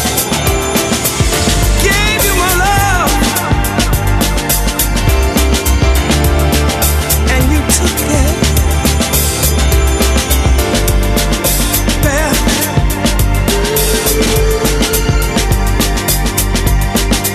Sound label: Nu Jazz Samples